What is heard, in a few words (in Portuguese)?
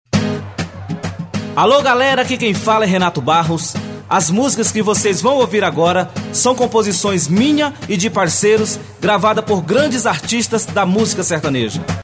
vinheta